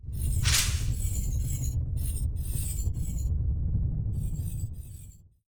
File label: In Flight Whip Crack